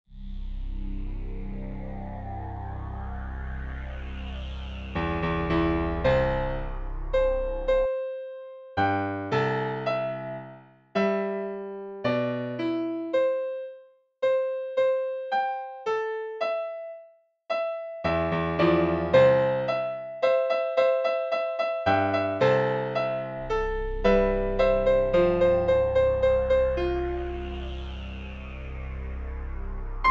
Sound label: intro piano